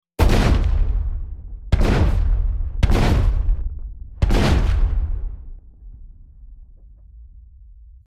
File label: Shotgun Blast Sound; weapon